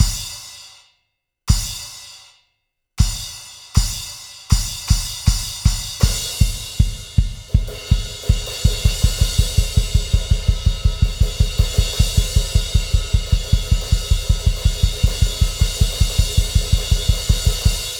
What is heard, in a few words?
Music Transition Perc; GnomeGnight